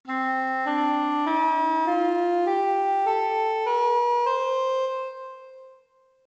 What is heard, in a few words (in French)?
rast, Gammes arabes; GAMMES EN MODE DE DO = RAST